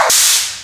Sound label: dog pneumatic; Source Engine; video game; npc